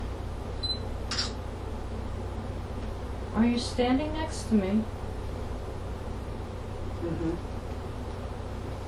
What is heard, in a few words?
hum hum response